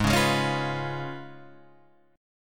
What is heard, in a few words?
Db 7th Ab, guitar